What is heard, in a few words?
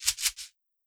SHAKER; Crashes & Cymbals